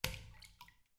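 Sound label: water drop distant muffled soft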